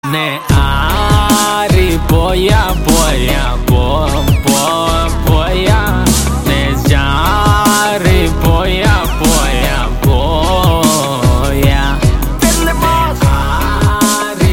Song Ringtone, Telugu; Savaari

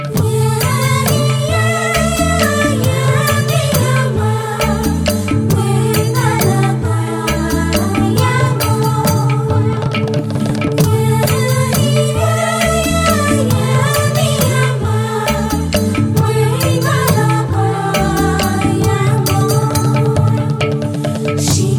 BG Humming Ringtone; Anegan; Tamil

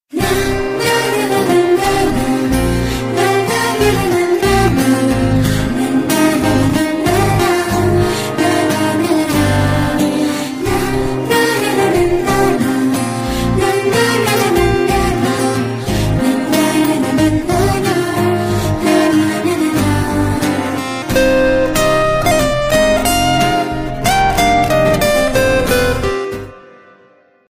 Intro Bgm; Raja Rani; Tamil

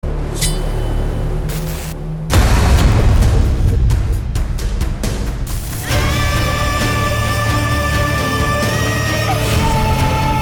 Action Bgm Ringtone; Mufthi; Kannada

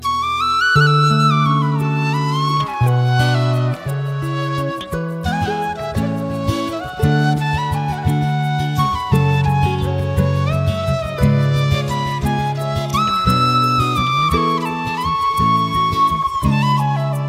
Flute BG; Pelli Choopulu; Telugu

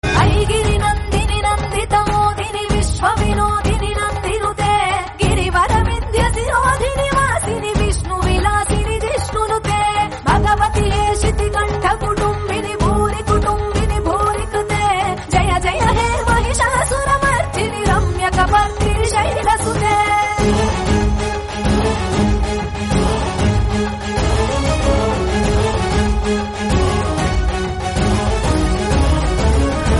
Dussera Durga Puja Telugu Song Ringtone; Devotional

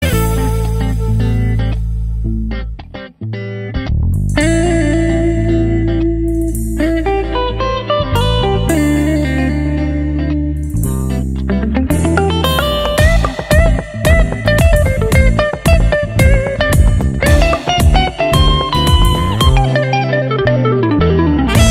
Instrumental Piano; Rowdy Boys; Telugu

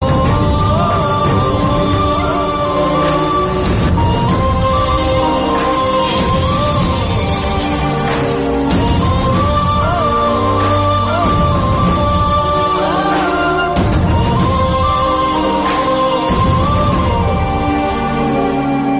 Climax Sad Bgm music; Nenu Local; Telugu

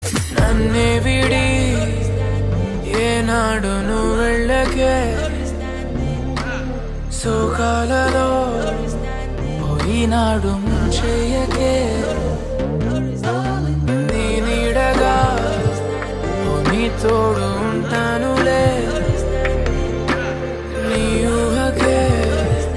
Ringtone, Telugu; Varun Doctor

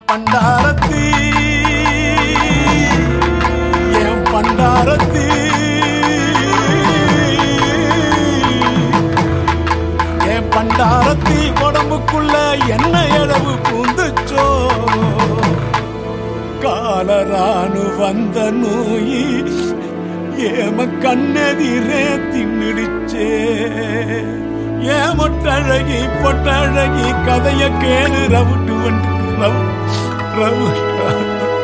Crying BGM Ringtone; Karnan; Tamil

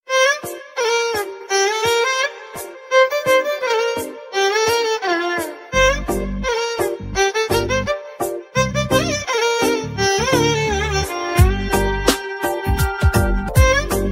Lovers Telugu BGM; Chalo

